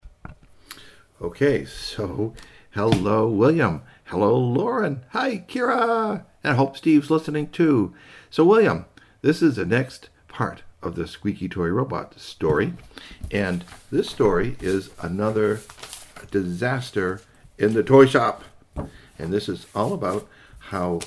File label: Squeaky; Chapter 4: Another Disaster at the Toy Shop; Ayatsuri Ningyo Robot - Squeaky Toy